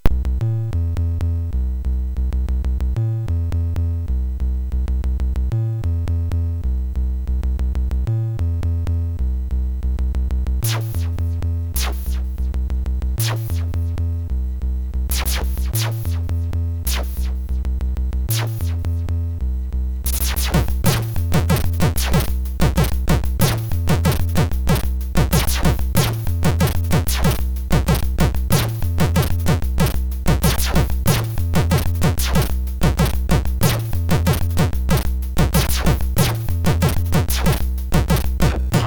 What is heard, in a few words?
Drum n Bass, MOS6581R3; sid; hvsc